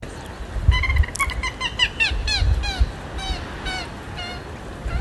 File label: common gallinule